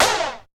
Snare; ZERO G - Datafile DISC 1

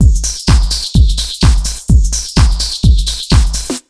127 BEAT, Fresh Disco House; USB Soundscan vol.20 - Fresh Disco House I

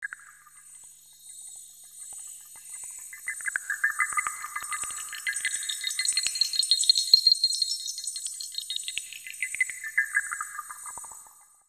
Digi Pads; Jungle Frenzy 1

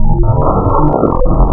KORG VS, wavetables, Classic Synths

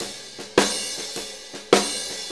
Blues Loop, Drum Tools, Full Kit Loops

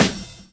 drum chop; AMG Gota Yashiki Groove Activator

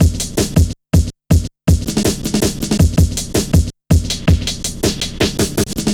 Drum Stepz, Drum Loops 161.5; Zero-G Jungle Warfare 1, 2 & 3

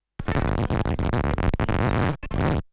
Turntablism; Sonokinetic - The Roots Drumloops

